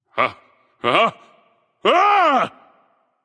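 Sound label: nonverbal fear; smite; voicepack; merlin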